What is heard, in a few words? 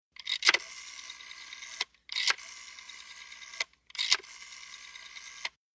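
British Desk Telephone Dial 999, pulse rotary dialing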